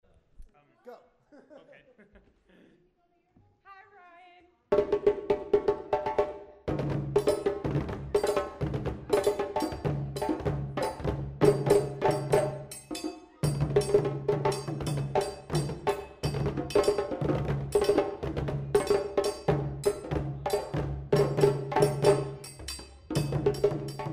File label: call; kassagbe